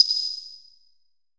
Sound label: SYN BEL, FX Synth Bell; AKAI S6000 CD-ROM - Volume 5